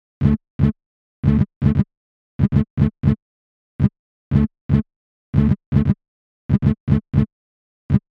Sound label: 117 bpm synth envelope sequence; instruments; loops